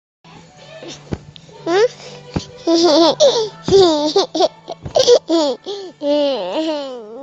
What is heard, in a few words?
baby laughs